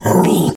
dog growl

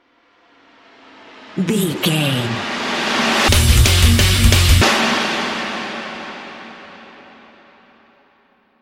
rock power stinger; Sports, Sports Rock, Pop Rock, NFL, Football, Sports Music, Rock, Hard Rock, Metal, Driving, Bikers

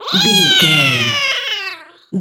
goblin fairy growl

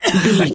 voice male cough short croak

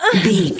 voice female hurt pain

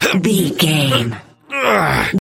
voice male effort long

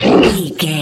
troll monster attack fast

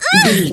voice female hurt pain

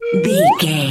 whistle slide wobble notes short

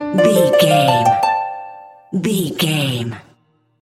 collectable item bonus

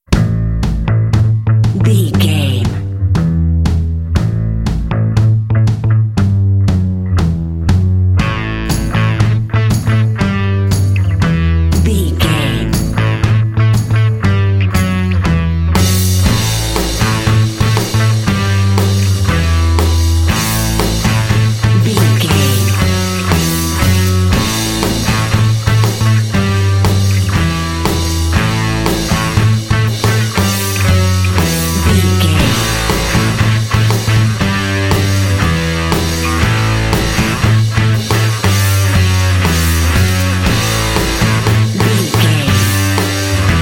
noise god narrative mix

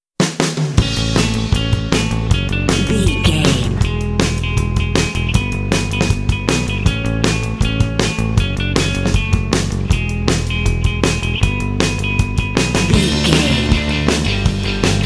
indie pop bed mix

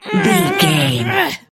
voice female effort push

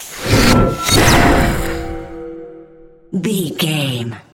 dark magic conjure blast

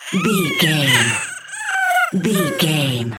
goblin fairy death

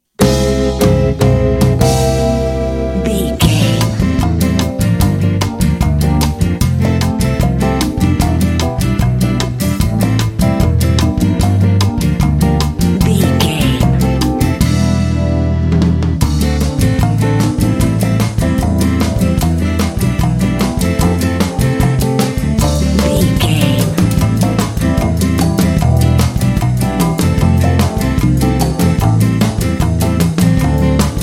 kitchy elevator comedy lounge bed

